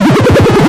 Multiple interface error tones at various pitches.; Error, Interface Pitch, Tone Web Efx, Error